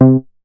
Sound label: Moog; leo-sounds